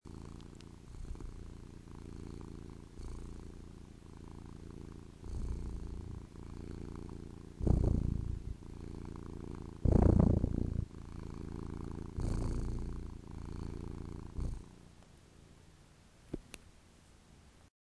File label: mephisto purring